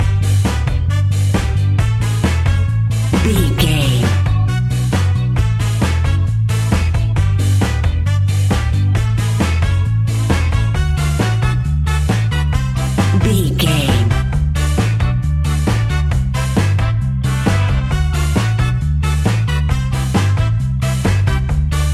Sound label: ska music groove, Classic reggae music with that skank bounce reggae feeling.